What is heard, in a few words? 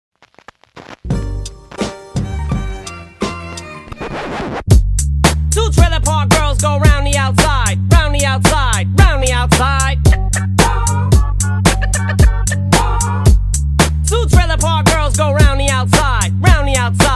rap remix